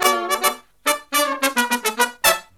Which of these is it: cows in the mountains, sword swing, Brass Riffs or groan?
Brass Riffs